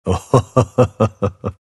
pangolin laugh; dota

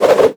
troll cast net; weapons; dota; creep; neutral

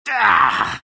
riki pain

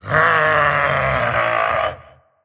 attack emote long; slyrak; dota

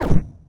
ult off; weapons; dota; largo

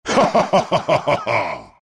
laugh; dota; furion

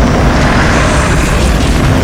flaming lasso middle; weapons; dota; batrider

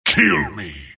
death long; dota; skeleton king

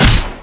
attack; dota; axe

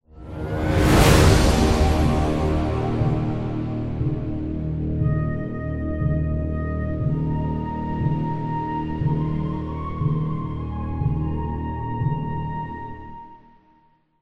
lose; radiant; stingers

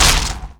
projectile impact; dota; pugna

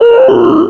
death wildkin; dota; creep deaths

